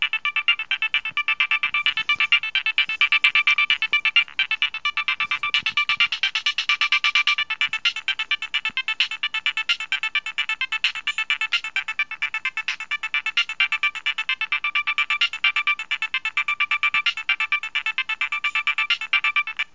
nokia 3510i futuristico ringtone

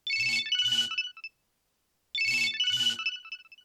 nokia 6100 ringtones; rise n shine

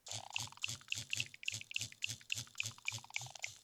nokia 6100 ringtones goblin

